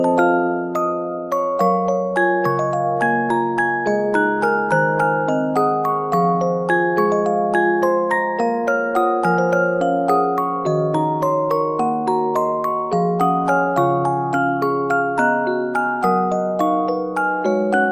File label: Music Box